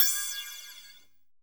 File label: odd bell; Drums In Your FACE!